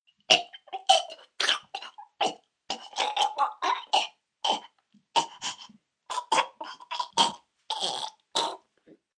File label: Baby Vomiting, Choking, vomit, 1 child, small heaves, human voice, kid